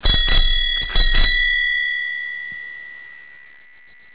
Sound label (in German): Fahrradklingel